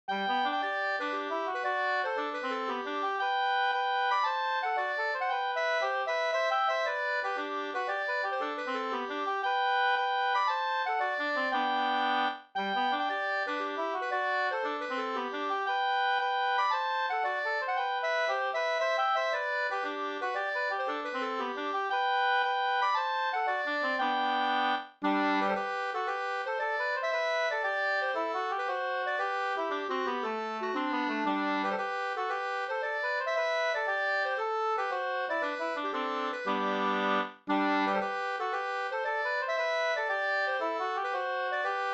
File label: polska; CA Hakberg SvL Vg59; Axel Boberg; Svenska låtar, Västergötland, nr 59; med andrastämma av Lennart Bergsström